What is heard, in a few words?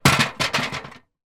industrial step ladder metal place down; zapsplat